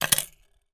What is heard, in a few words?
household key ring plastic place on wooden surfae; zapsplat